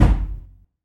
Tamburo Kick Low Jazz Single

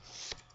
System Notification

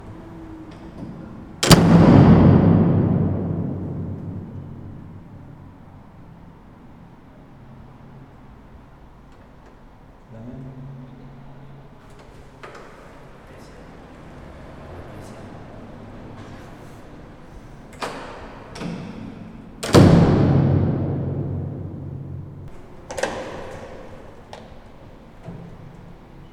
Large Door

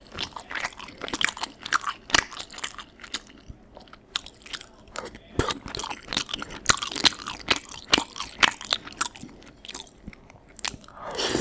Noisy Eating

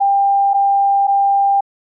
Headset Battery Low

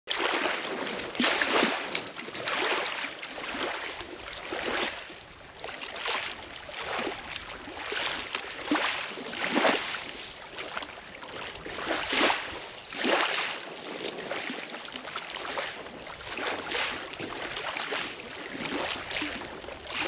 Waves On Beach; Janice Beach

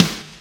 Stereo Processed Snare Drum

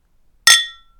Glasses Clinking

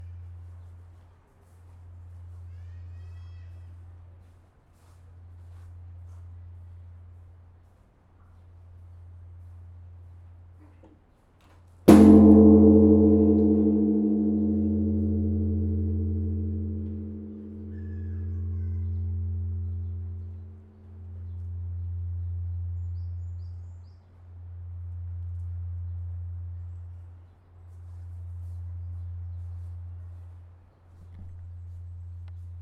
gong in zen buddhism

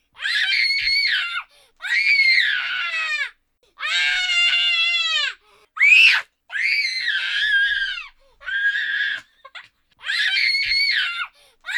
High Pitch Chilling Screams

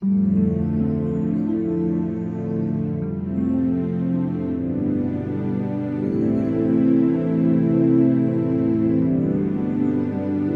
Meditation Daytime

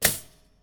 Basket hit

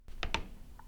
Elevator Button Press

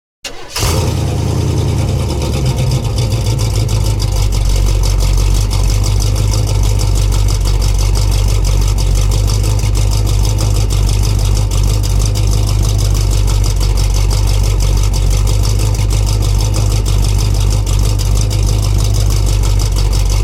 Truck; Sonic

